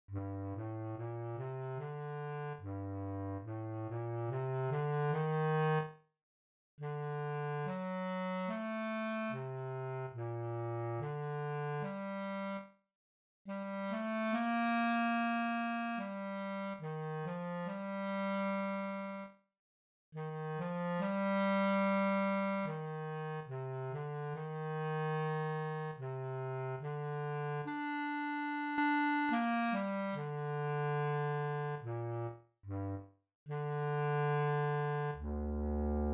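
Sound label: Bass Clarinet Contra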